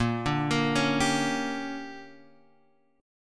D Major 7th Suspended 4th Sharp 5th

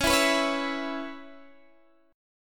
Db Minor 7th Db Guitar Open D

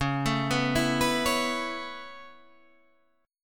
Db Minor 7th Sharp 5th Db down, chord; arpeggiated; JGuitar

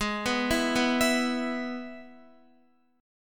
Ab Minor Sharp 5th Ab down Guitar; Abm#5 arpeggiated; JGuitar

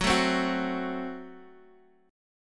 Gb Diminished Gb, Guitar Standard; strummed; x,x,4,2,1,2; JGuitar

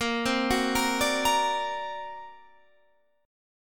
D Augmented 7th Bb, guitar

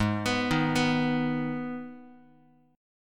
G sharp Minor G sharp down Guitar, chord; G#m arpeggiated; JGuitar

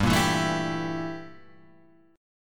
G Minor 6th Add 9th G down

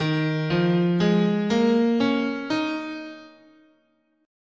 Eb Suspended Pentatonic, scale

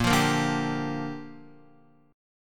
Bb 6th Bb, Guitar; Bb6 strummed

